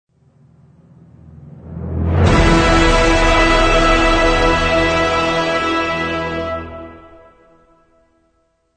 Emotional Inspiring Cinematic Epic Trailer Final Chord